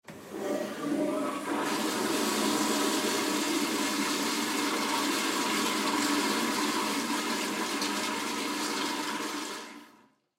Hospital Bathroom Toilet Flush; Emergency

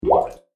Water Bubbles; Andrew Fleming

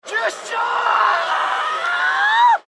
Man Yell At Baseball Game Fan Japan; Excited sports fan screaming in Japanese; Tokyo Swallows; Baseball Sound Effects

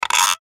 Lever Dial Gear Multimedia; Web Interactive; Technology; Noise; Electronics; Blastwave

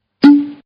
Cork Pull; Corks; Bars

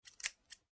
office scissors cut air single; Scissors Cutting Air ( Single Snip ); McKinney Sound

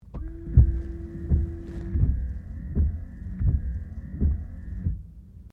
Car Toyota Corolla windscreen washer interior; 2009 Toyota Corolla hatchback; Cars; Vehicles

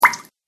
Water Button, button sound effects; multimedia

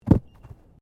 Body movement Tumble Light

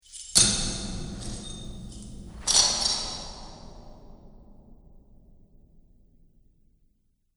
Dungeon Chains, clink, clatter, clang; Evil Sound Effects

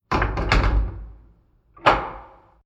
Apartment Hotel Inner Doors Closed Latched; Miscellaneous Door Sound Effects

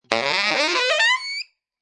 A crazy saxophone riff.; Horns; Musical

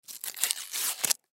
Food Dog Food Can Tear Label Off Fast; Household; Blastwave; Foley

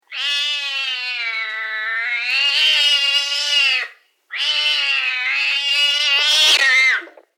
Cat Mean Vintage; Cats; Animals

